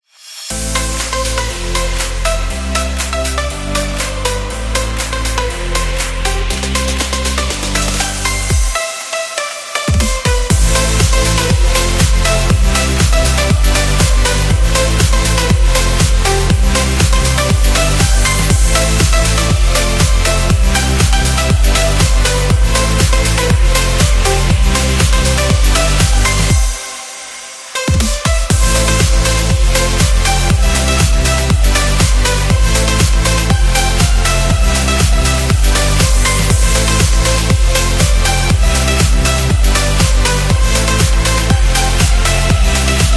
Pop EDM

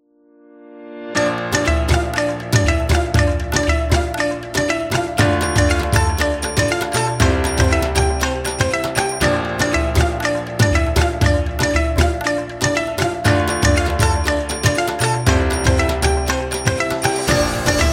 Indian Music

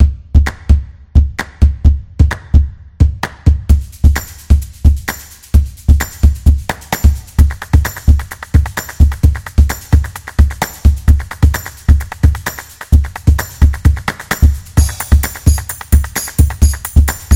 Stomp

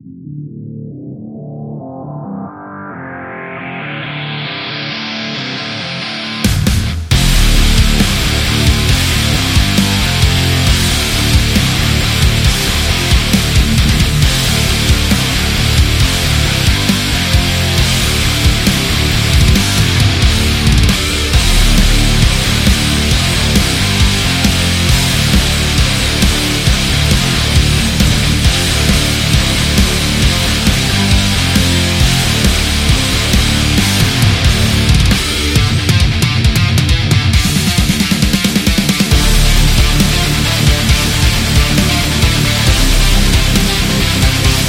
Hard Rock, danger

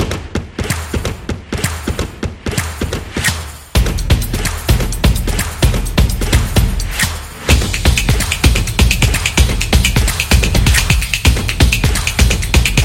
Percussion Clap

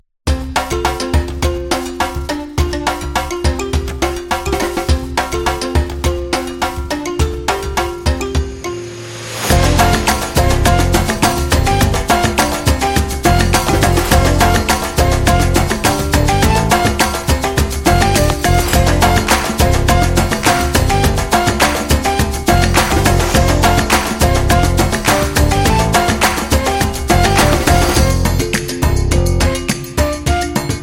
Zulu Jungle Music, african tribe music

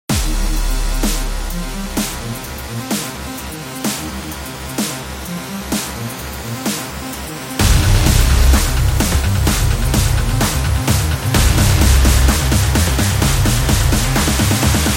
Dubstep Rock Sport

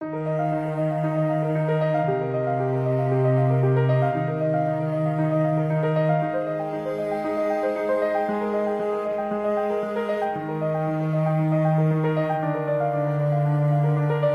Indian Classical

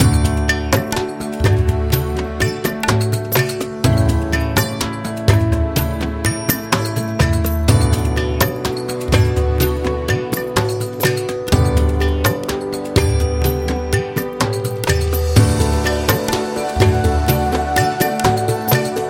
Indian Wedding

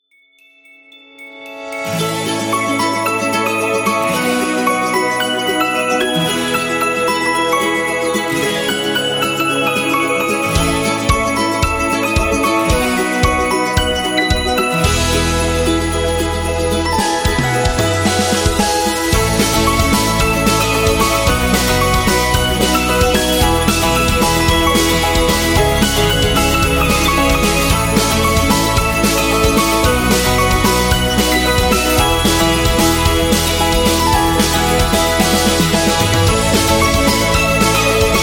Happy Holiday, ukulele

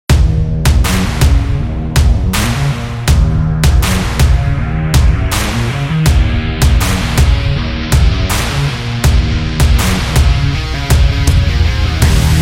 Hard Rock Intro

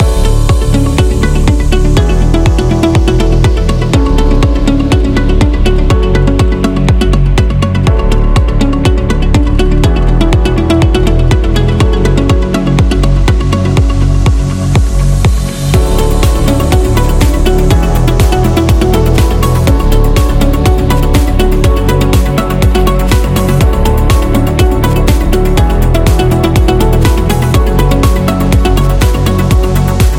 Uplifting Loop; corporation

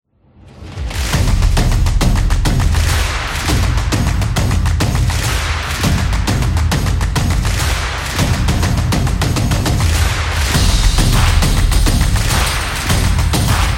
Drums Action

